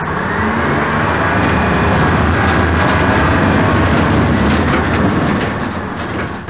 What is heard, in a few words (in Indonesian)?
suara mobil